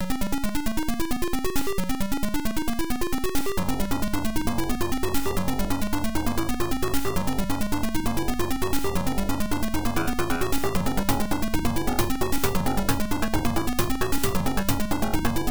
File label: Round BGM; Logical; PC-98